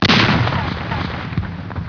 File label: Cannon